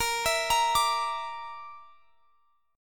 A sharp Major Flat 5th; A#Mb5; arpeggiated; x,x,20,21,23,22

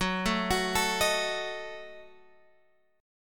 Gb Minor 6th, Guitar Open G; 16,14,16,14,16,x; down; chord shape

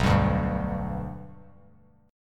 B Minor Sharp 5th B Bass Standard, chord; strummed; JGuitar

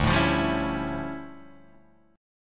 D Minor Major 11th, down, Guitar, Open G

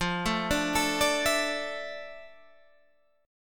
D Minor Add 9th, F; Dmadd9/F; arpeggiated; JGuitar

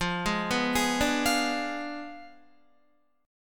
F Diminished 7th F down Guitar; Fdim7 arpeggiated; JGuitar

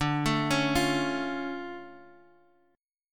D Minor Major 7th, D; 22,24,23,22; DmM7 arpeggiated; JGuitar

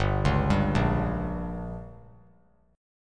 G Diminished; arpeggiated; JGuitar

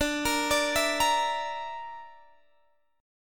D Suspended 2nd Sharp 5th D Guitar Standard; Dsus2#5; arpeggiated; x,17,20,19,17,18; down; capo=3

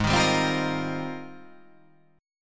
G sharp Major 13th

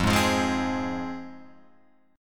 F Minor F down Guitar Open F; strummed; JGuitar

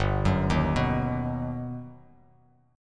G Augmented Major 7th, Bass Standard; G+M7 arpeggiated; JGuitar

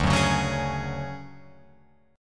D Major Sharp 11th D Guitar Open F, chord; strummed; JGuitar

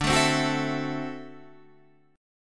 D 9th D down; strummed; JGuitar

